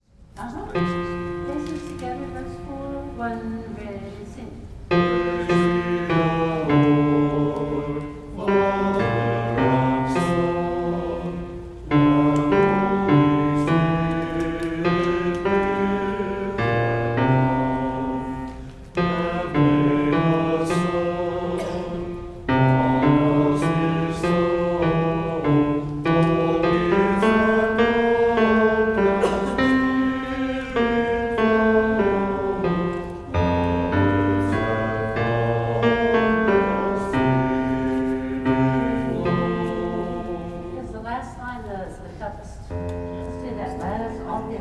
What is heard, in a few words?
bass; Come Holy Ghost; vs 4; Gather Hymnal